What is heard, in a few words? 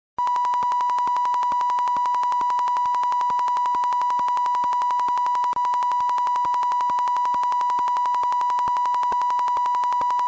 sinewave